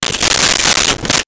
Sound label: Masking Tape; Office Supplies